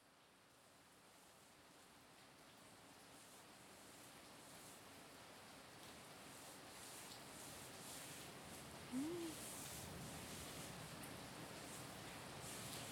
ゆったり安眠（おやすみトントン、心音）

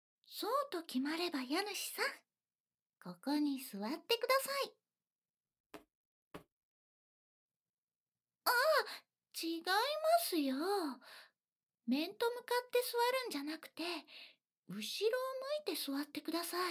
ヘッドマッサージと肩マッサージ, ASMR

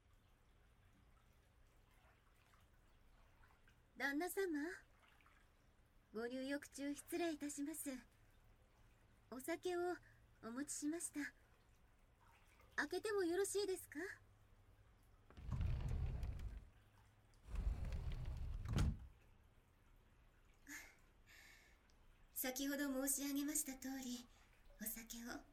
露天風呂; 一緒に露天風呂; 02夜

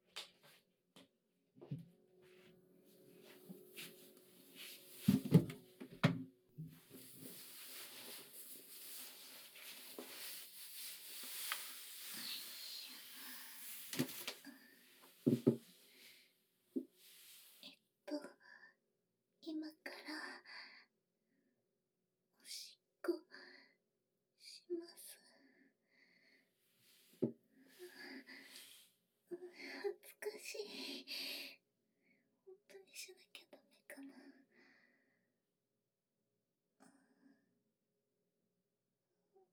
おしっこ

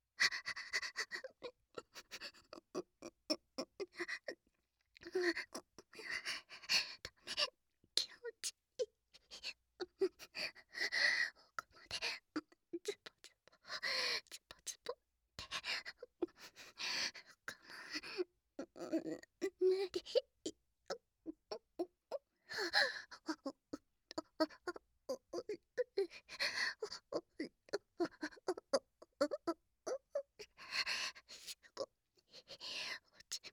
喘ぎ キス喘ぎ

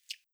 しぶき 短, ぴゅっ 短め

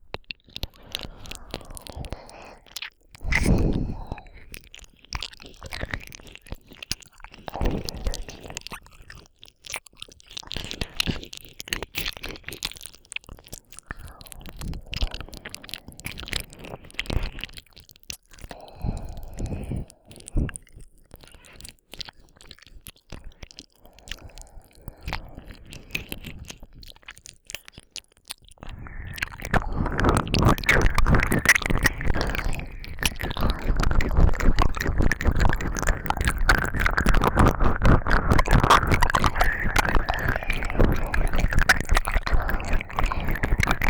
耳舐めループ 両耳

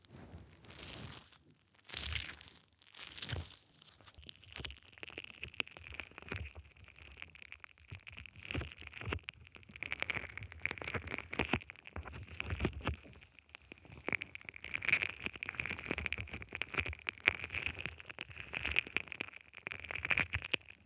スライム

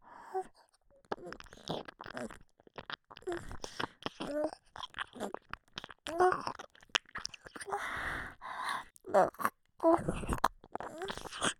かぷっ…くちゅ…, ASMR

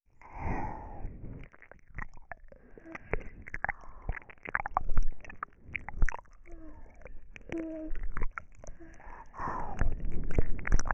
セリフ無し耳舐め(じゅるじゅる多め)

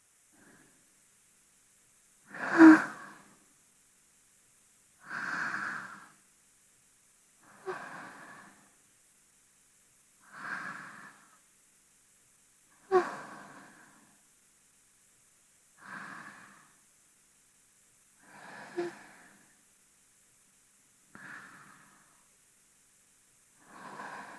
寝息ロングループ（バイノーラル編集）; ミミカノ vol4 寧々; 美少女耳かき添い寝シリーズ・スタジオ収録; 効果音なし

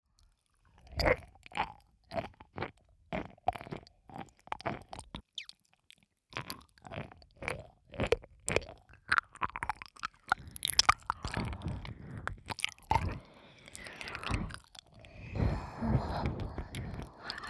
耳舐め 左

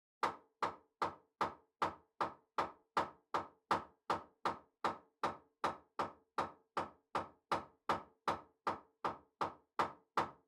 切る 虚空; KU100; 食事に関する音

